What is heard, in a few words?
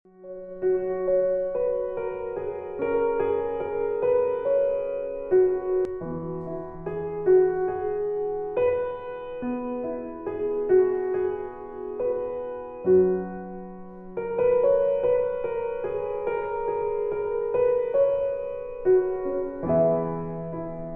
Peaceful Piano